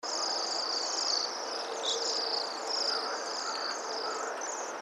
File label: waxwing bohemian